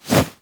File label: Arm Swing Foley Heavy; Warframe; Purgatory Warrior